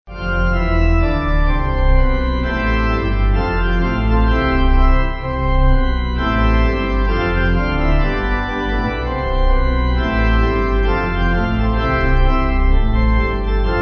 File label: Pipe